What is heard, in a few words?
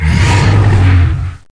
spawn boss; Devastator; SAS Zombie Assault 4; pc android ios macos